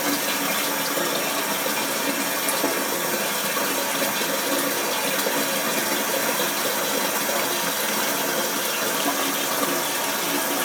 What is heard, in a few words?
toilet running